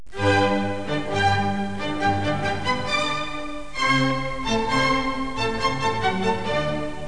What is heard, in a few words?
violin; Explorapedia